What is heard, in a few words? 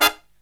HI CHORD, Brass